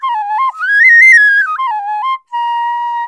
INDIA PICC; Spectrasonics; Heart of Asia; IND PICC PH

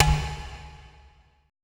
E TAB SLP F#; ETH DRUMS

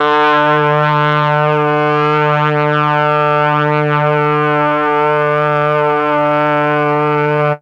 MEL BRASS; ILIO; Propeller Island; Legendary M400

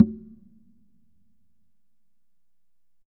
Congas wet, drums percussion

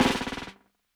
SNARE BUZZ, electro kit; JUNGLE BEATS

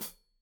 Hat Foot, Hi-Hat, Cymbals Stereo

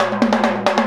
Timbale; Sonic Foundry (Sony Creative Software) - Essential Percussion

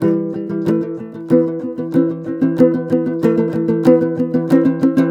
TOPSHUR; Soundscan vol.60; Asian Traditions; AKAI

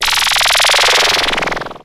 sweep, SCIFI; Techno Trance Essentials

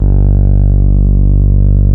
Taurus, Moog Taurus; Greytsounds VOL-1

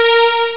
string down; Amiga Soundtracker Sample Packs

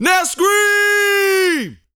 VOCAL, R&B Dance, HIP-HOP

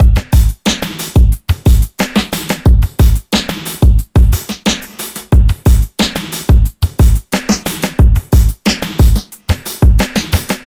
shovel 090bpm; Zero-G; Downbeat Dance Grooves

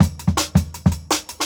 Jungle Breaks; Original Jungle Breaks

